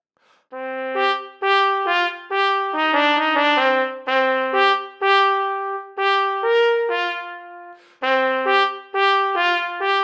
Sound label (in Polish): Trabka